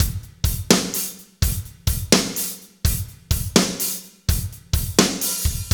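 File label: slow shuffle, Roland drum beats; Northstar - Drumscapes Roland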